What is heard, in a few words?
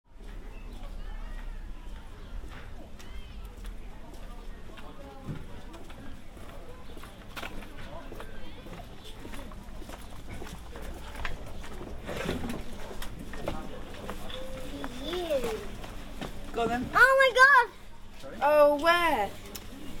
Neptune's door, whitstable